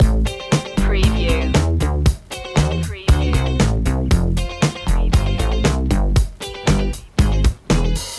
boogie disco loop

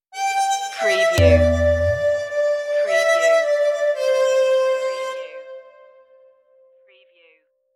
indian lullaby stinger

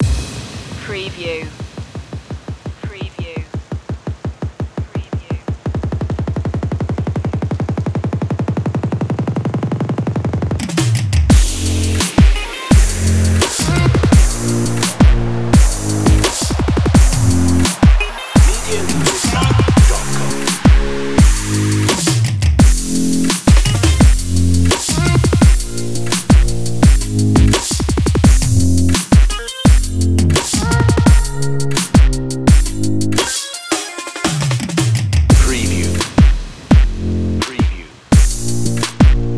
get crazy no lead synth

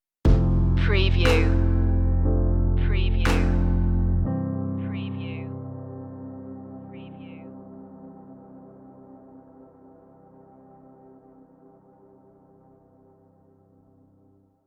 summit stinger

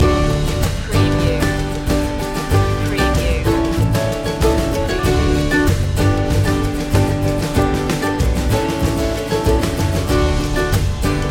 chant no vocal

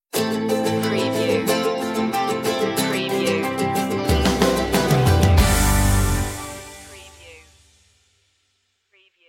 open door sting

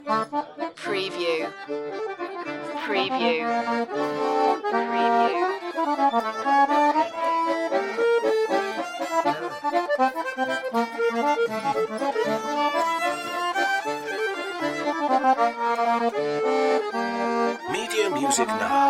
french street musicians song

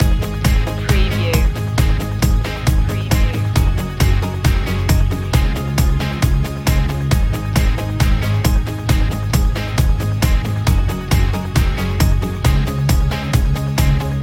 rock drop and roll loop

